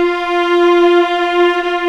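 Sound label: viola F4, ARCO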